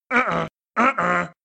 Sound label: Uh Uh; Chunky Kong